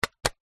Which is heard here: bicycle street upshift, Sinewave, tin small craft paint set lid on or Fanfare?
tin small craft paint set lid on